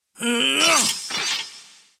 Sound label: Shield; SFX 3D Heroloin Shield